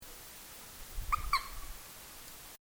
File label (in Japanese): ban, 2010 04